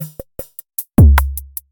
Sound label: Rhythm maker Randomized 140 Bpm Loop